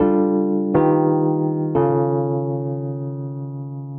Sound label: Jazz Keys El Piano 120 G, Chordy Loops, 120bpm